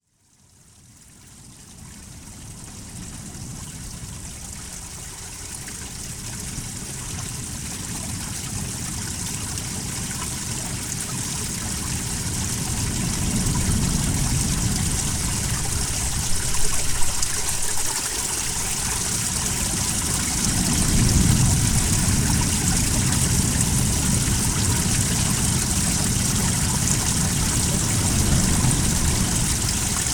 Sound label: Beach 3d, Soundscape